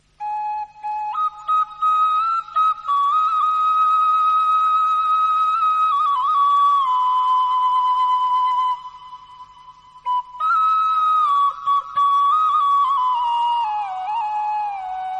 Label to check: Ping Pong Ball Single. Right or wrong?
Wrong